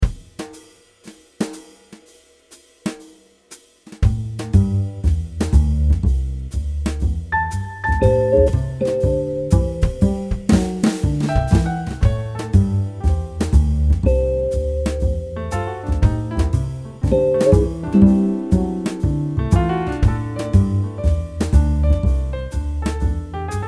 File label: Jazz